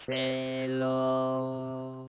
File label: Cello Voice Morph